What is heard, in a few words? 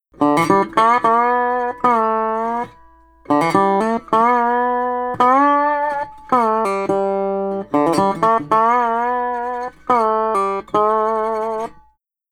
Country Dobro Chorus; Digidesign; Pro Tools Essential Loops